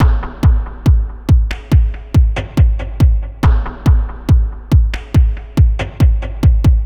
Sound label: hard beats 140, hard trance beats